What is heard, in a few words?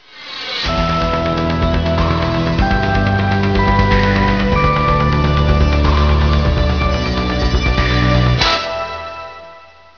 theme